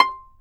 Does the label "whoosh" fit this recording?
No